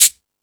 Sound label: Timbale, E-MU Pro-Cussion